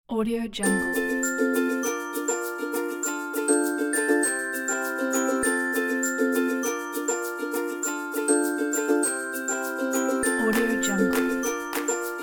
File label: happy